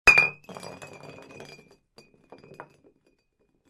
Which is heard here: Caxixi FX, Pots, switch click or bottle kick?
bottle kick